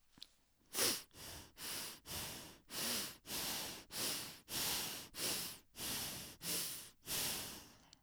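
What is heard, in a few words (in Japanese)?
呼吸音（激しい鼻呼吸）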